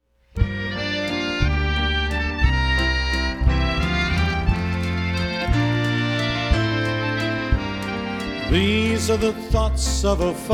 Waltz

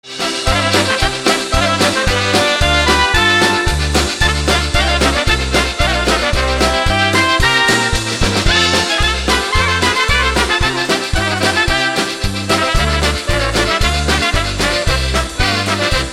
House Party Polka

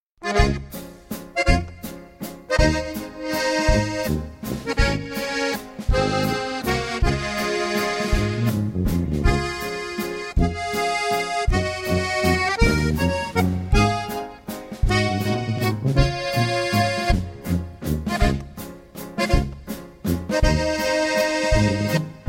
Waltz

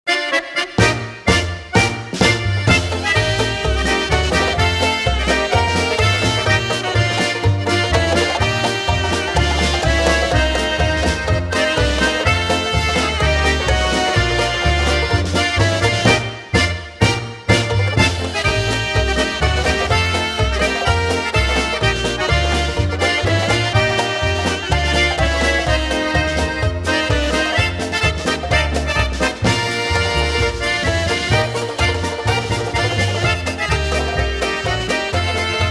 Polka

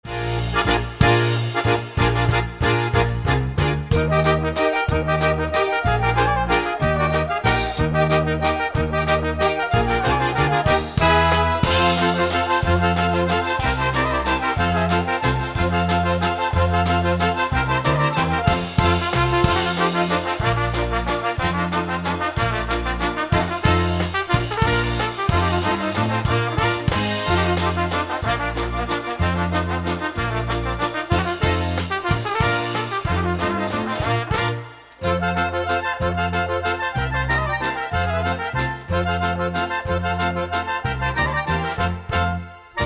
Oberek

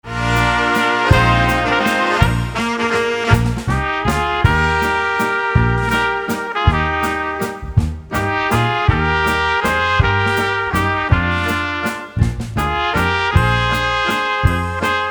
Waltz

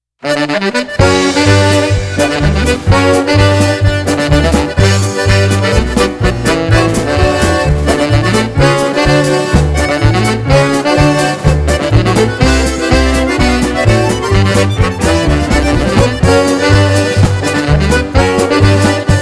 Polka

